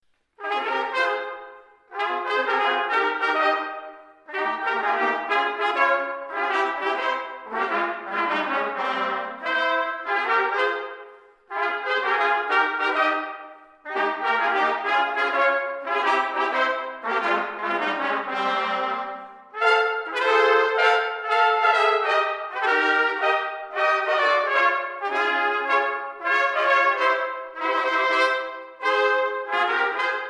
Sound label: Samba, galm